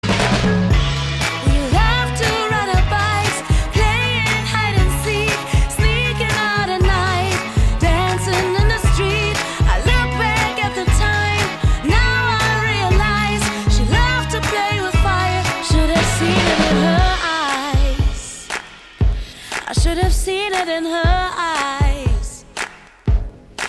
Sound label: Cry Cry